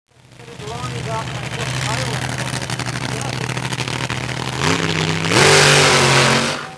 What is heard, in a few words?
DRAGSTER